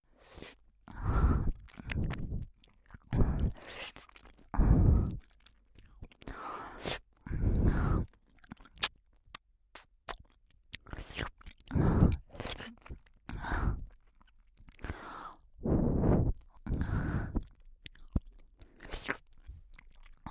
耳元でえっちな吐息

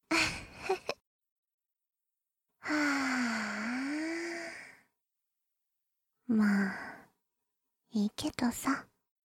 あっはは; 必然的セックス欲求

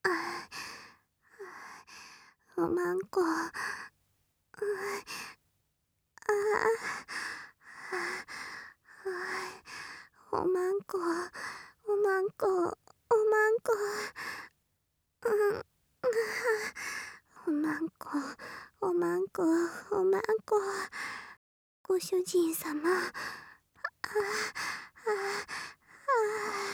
おま○こ 喘ぎ, ASMR

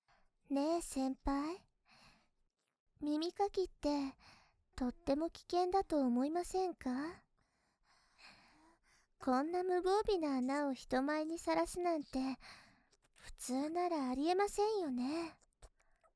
耳かき, なめる

